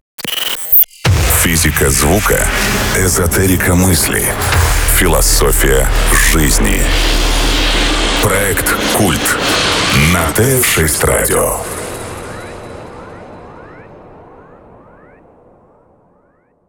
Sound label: jingle